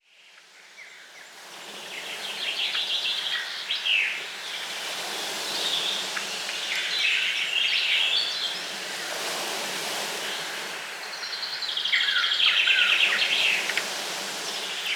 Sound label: Relaxing Ocean Nature